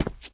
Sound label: boot